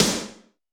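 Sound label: Snare Media Hype, Alesis D4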